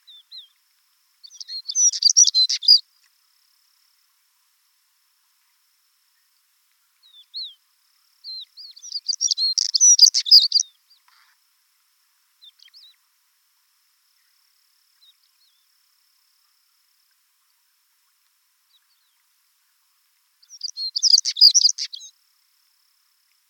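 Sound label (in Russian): chernogolovyy chekan golos, stonechat voice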